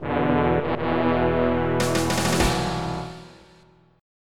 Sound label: FANFARE